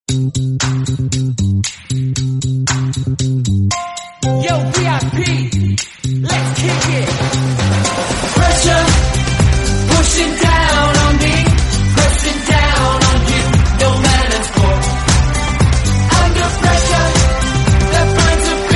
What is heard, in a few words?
english song tone ringtone